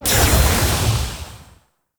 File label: Sword Heavy Attack Burst; Enemies; Weapons; DE; Warframe; Teshin